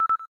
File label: cam timer last